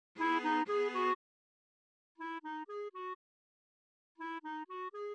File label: cello melo